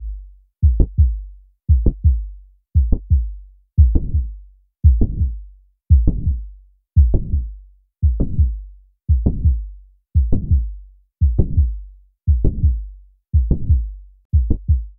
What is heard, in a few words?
mitral prolapse, cardiac; pediatric; pediatric-ai-scribe